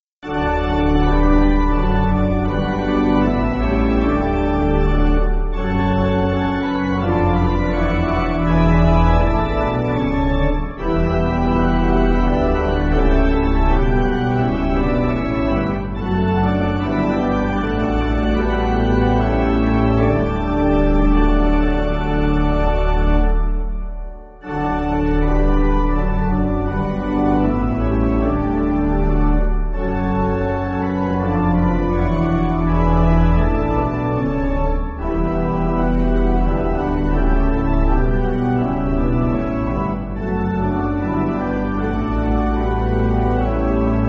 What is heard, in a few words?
Pipe